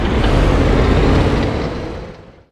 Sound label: wolf start; gdi